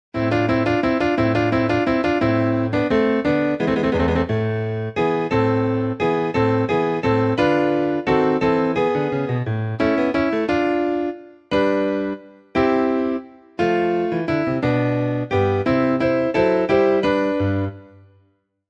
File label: ACCOMP Audition